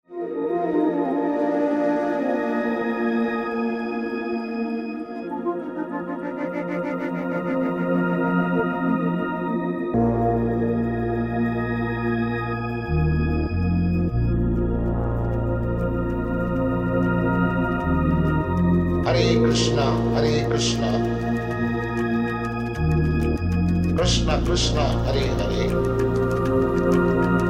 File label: maha mantra ambient; prabhupada